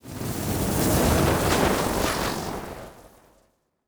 Fire Spell, Magic Sounds; Fantasy Sounds Bundle

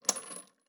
Chain; Fantasy Sounds Bundle; Craft

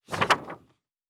Book Page; Fantasy Sounds Bundle

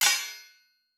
Blacksmith; Fantasy Sounds Bundle; Craft

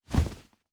Wing Flap, Monsters; Fantasy Sounds Bundle

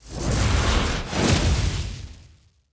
Fire Spell, Magic Sounds; Fantasy Sounds Bundle

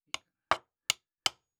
Nail; Fantasy Sounds Bundle; Craft

